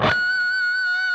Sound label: PRS PINCH, Guitars